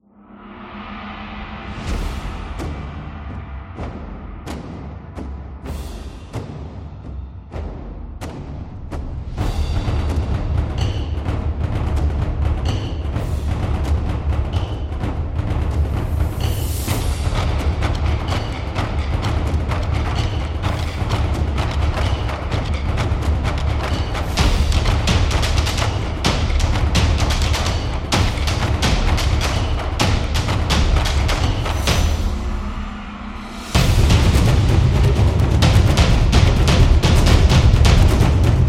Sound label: Japan Opener, tribal dance